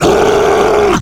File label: Bowser Roar; MSC